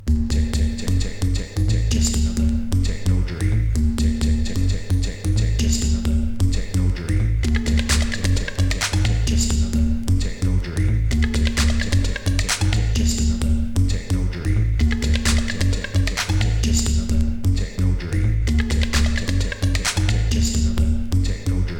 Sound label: techno